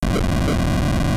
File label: Explode